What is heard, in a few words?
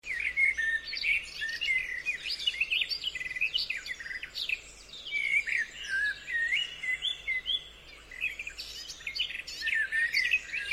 forest; 潮汐白噪音